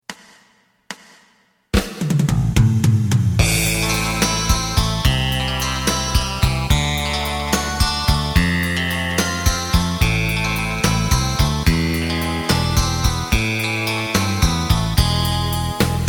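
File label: side guitar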